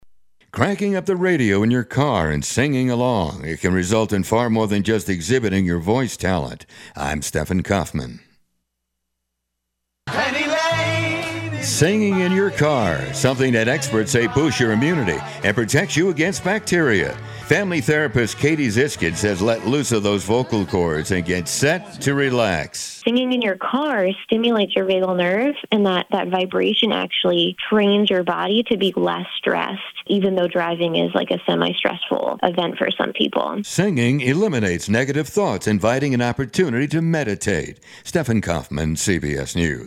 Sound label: Car Karaoke